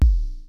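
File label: bass drums, synth drums; Syntec; Wall of Sounds; TECHNO-BOX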